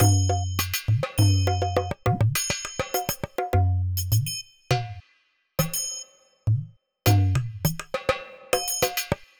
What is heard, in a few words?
dholki